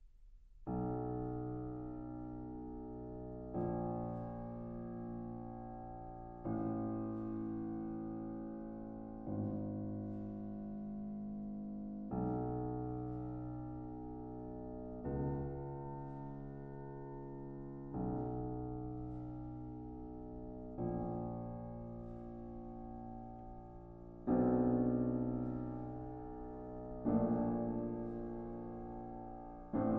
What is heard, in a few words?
Sonata for Violin & Piano, Grave, Maestoso